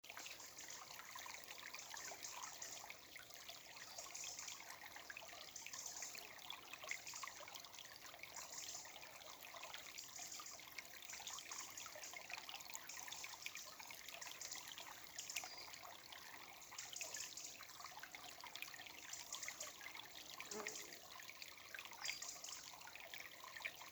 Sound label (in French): chants oiseaux foret